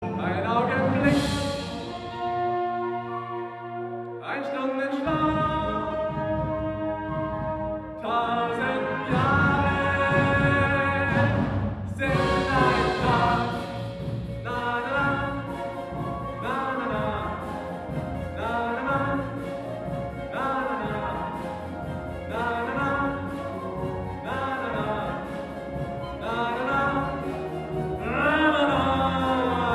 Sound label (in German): Blaesergruppe Big Band; Musikdarbietungen bei der Kunstnacht